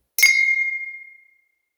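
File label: romantic champagne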